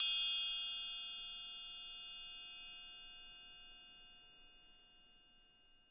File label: TRI LGF HI, orchestra; Best Service; Peter Siedlaczek's Advanced Orchestra